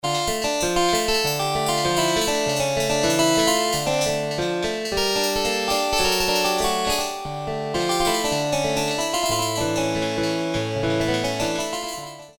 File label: HARPSY, Korg DSS-1